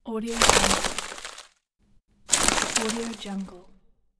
fast wings flapping; moonaudioproduction

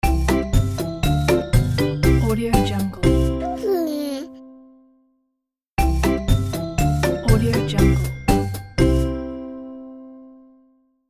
playful baby logo

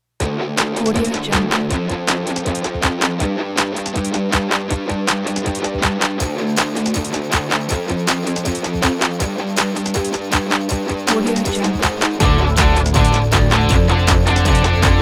breakbeats rock and sports; divaproductionmusic

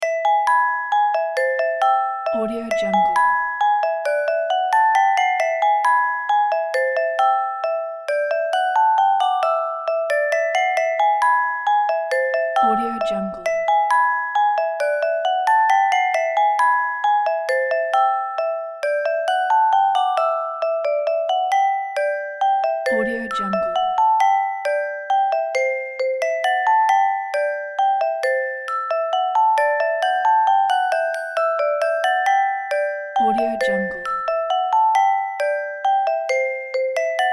medieval musicbox

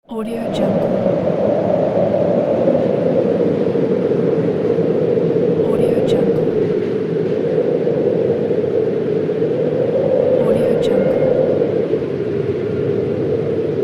sound of winter wind pack

